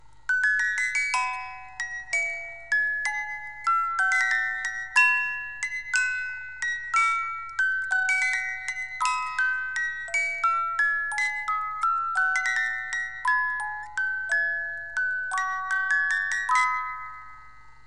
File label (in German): Blumenwalzer, Musikwerk 18-Tunes; Waltz of the Flowers